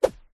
foley stick or thin object swipe swoosh whip through air fast